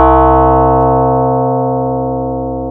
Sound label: Synth C3